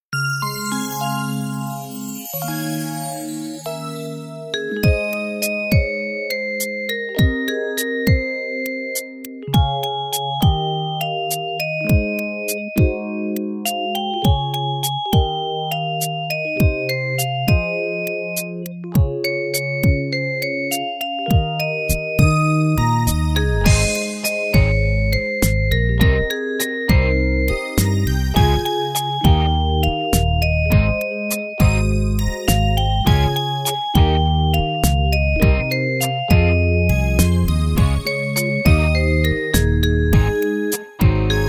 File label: karaoke